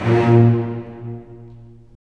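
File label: STR, strings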